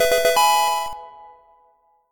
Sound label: levelup; minecraft